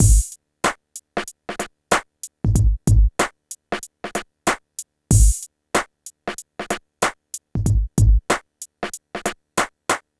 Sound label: PHATT BEA; E-MU Formula 4000 Series Vol. 1; Hip Hop Nation; PhattBeats91-94